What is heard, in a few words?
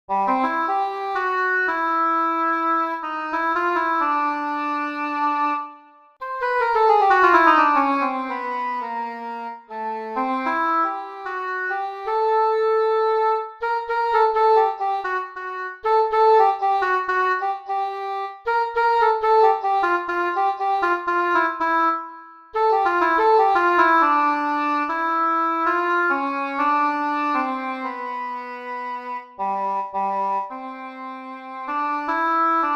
English horn